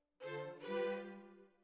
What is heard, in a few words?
Violins Over